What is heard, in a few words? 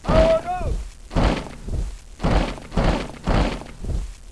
FORT; Caesar III